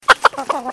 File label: Chicken; smart-shoes-attack-chicken